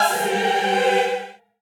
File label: choir